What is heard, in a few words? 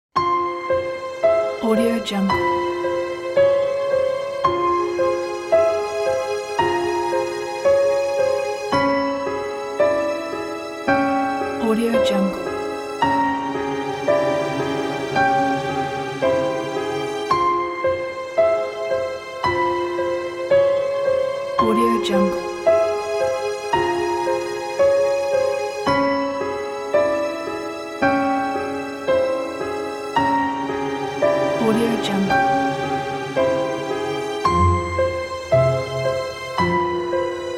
creepy